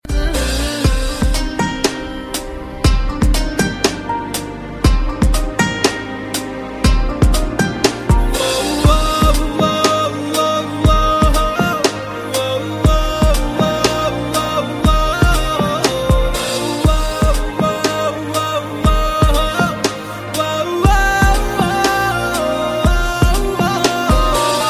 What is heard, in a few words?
Bgm